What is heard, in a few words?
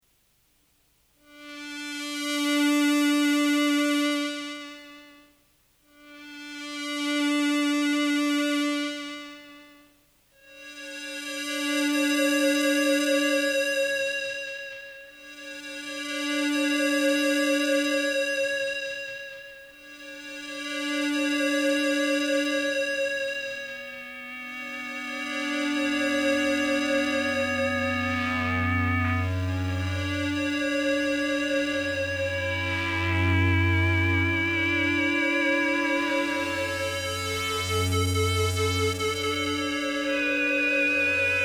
Loop And Solo